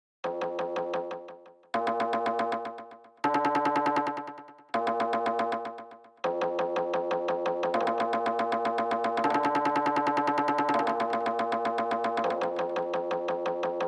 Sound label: Ac Bass Guitar Flutter